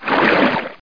turn, hydra; Hexen 2

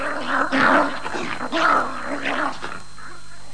snarl

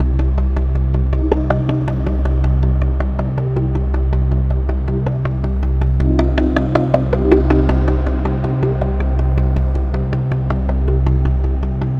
AMBNT 160; Ambient

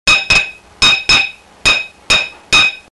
hammer

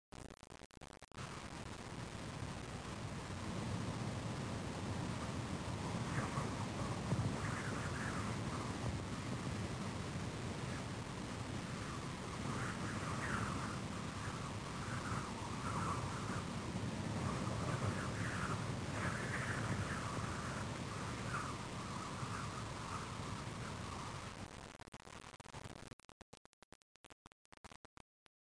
STORM; ambient; weather

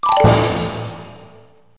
xylo fin; Wired for Sound Pro CD; Aristosoft, Inc.; music

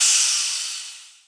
waste

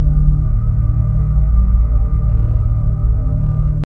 Bass; alone in the dark 4

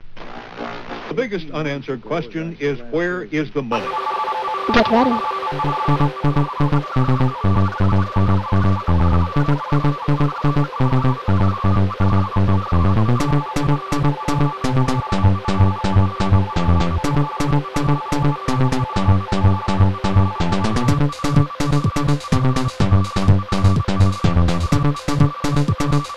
tune; The Module Collection 1

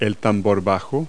bass drum; Multi-Lingual Talking Picture Dictionary

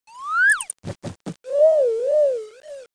Laser Lab Sound; Zap!; EdMark Corporation

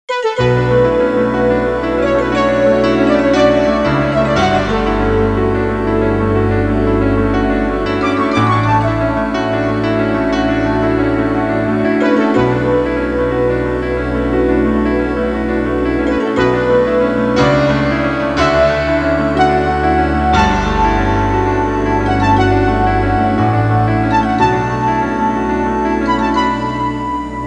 synthy; Der Grobe Grafik Sound Trickkasten

